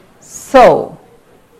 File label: saw